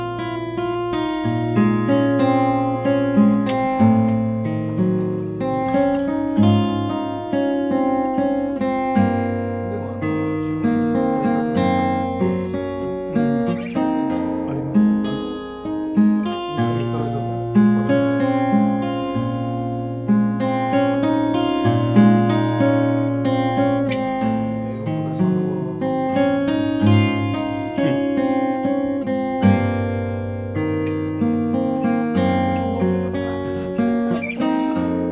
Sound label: Lowden